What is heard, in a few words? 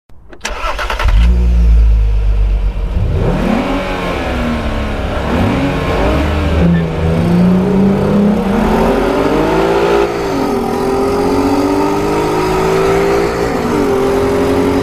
Vw R32 Starting